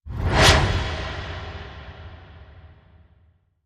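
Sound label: Whoosh Air Slap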